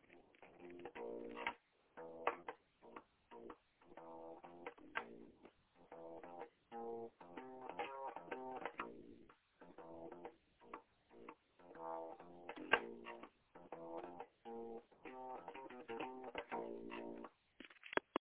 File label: suspensful bass with melody interspersed